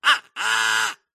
duck call vocalization uh-oh with gap mid error negative fail; Skyclad Sound